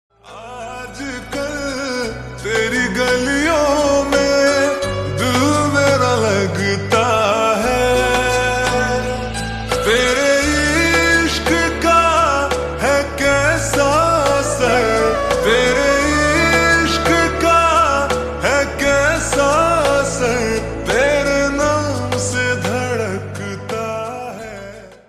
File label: Slowed Reverb Ringtone